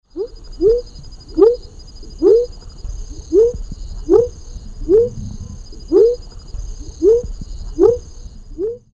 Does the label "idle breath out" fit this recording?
No